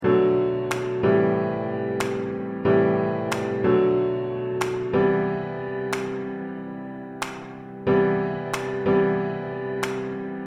Accompaniment

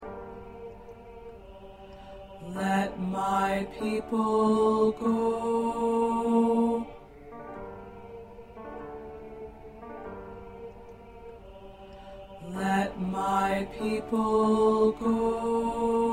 Go Down Moses SATB Tenor

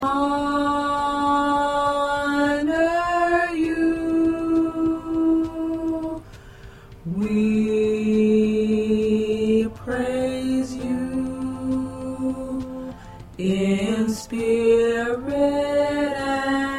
P Tenor

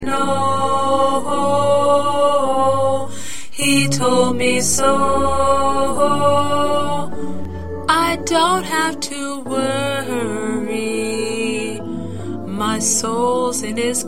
Lead

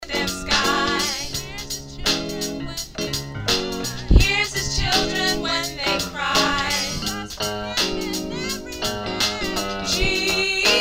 Soprano, choir parts

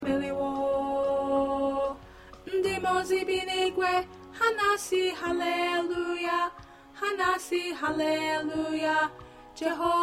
Alto, choir

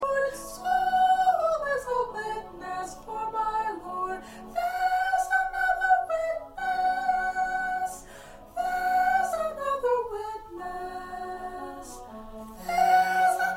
1st Soprano

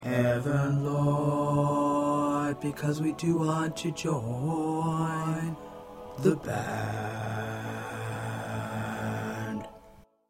Bass 2, choir parts; TTBB

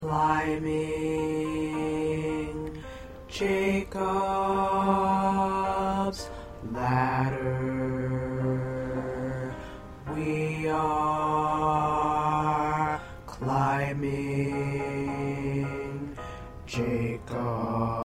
Bass

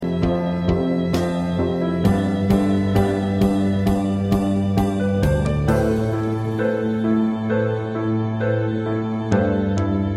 Accompaniment

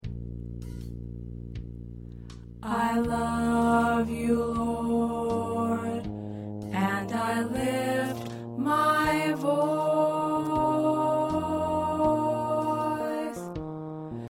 Alto, choir

